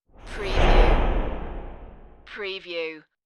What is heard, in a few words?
Whoosh